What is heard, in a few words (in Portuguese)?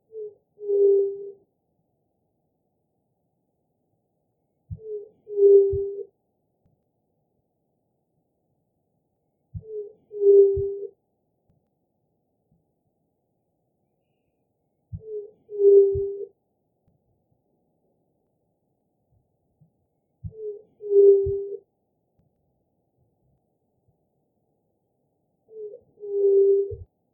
Canto da Juriti pupu